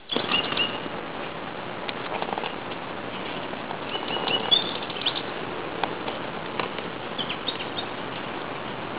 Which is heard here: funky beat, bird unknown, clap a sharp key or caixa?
bird unknown